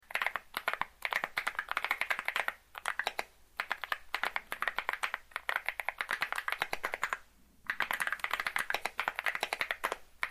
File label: Binaural Loop Keyboard sounds